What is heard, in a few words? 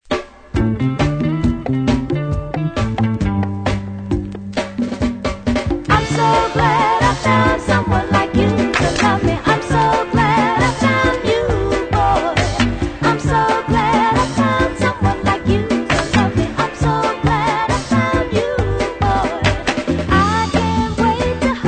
fuzz